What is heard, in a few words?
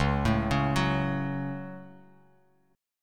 Db Suspended 4th Sharp 5th, Bass Standard; arpeggiated; JGuitar